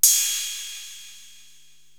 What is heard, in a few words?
Crash, Drum Machines